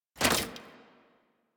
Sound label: Rifle Mech Release; Weapons; Warframe; Thanotech